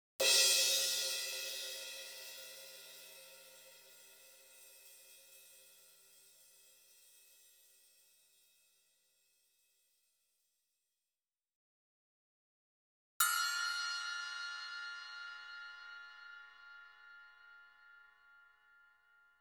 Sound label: crash+splash; Sound Sampling Collection VOL-5; Drums & Bass Fusion Funk Effect